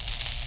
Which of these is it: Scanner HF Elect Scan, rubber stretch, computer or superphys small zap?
superphys small zap